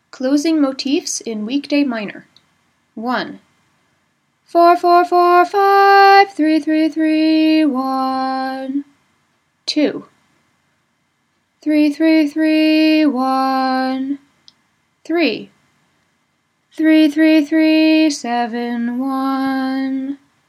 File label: weekday minor closings, nusach; SCALE AND MOTIFS FOR WEEKDAY MINOR